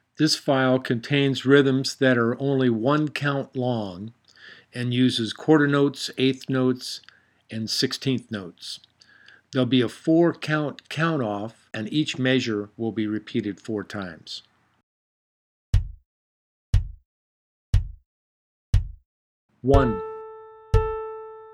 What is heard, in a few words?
1 Beat Rhythms